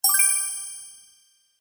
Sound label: Fairy Sparkle, magic; Magic Sound Effects